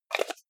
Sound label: jar plastic midsize close fast